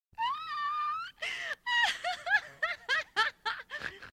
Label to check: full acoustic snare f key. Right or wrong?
Wrong